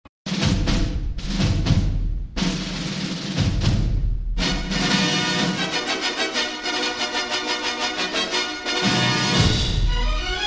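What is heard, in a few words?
fanfare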